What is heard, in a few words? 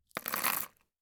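coffee bean sounds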